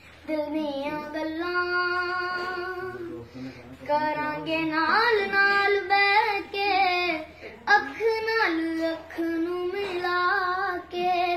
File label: Song By Little Kid